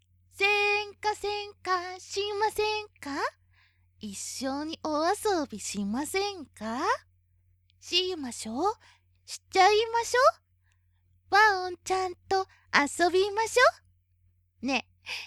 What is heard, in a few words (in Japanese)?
お誘い歌; こども好きのオオカミ先生